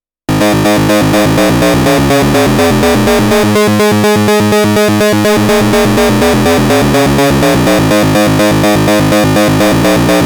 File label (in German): OSC FM Linie; GRP Module